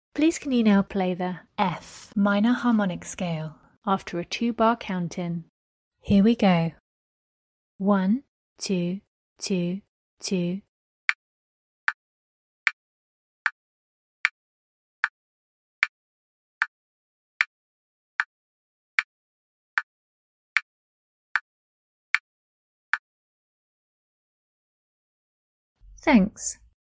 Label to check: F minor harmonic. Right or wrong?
Right